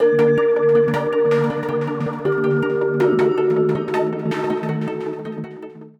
stinger win, Cinematic Battle Music

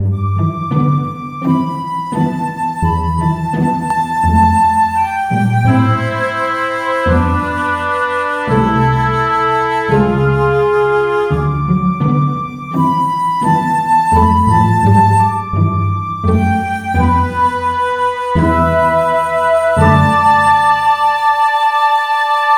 Friendly Village (Orchestral, 85 bpm); Ultimate Everything Music Bundle; Loops for All Occasions

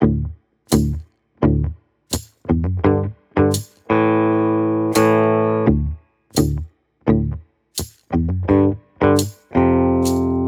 Western No Gallop; Western Music Pack

